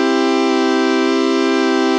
reference chord

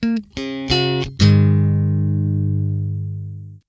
Bassman 100

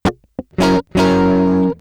A15Mk2 percussive; IDMT

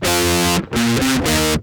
JCM900 Rock; IDMT

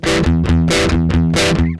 JCM900 palm mutes; IDMT

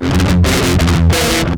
JCM900 Metal Triplets; IDMT

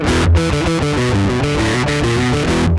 A15Mk2 metal motif; IDMT

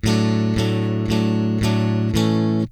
Bassman100 rock chords; IDMT

